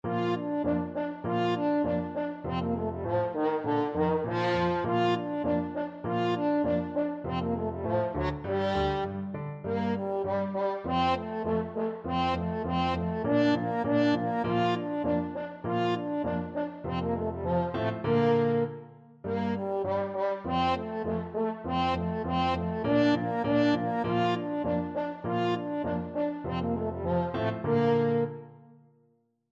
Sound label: Turkish March Ruins Of Athens, french horn; Beethoven; Op113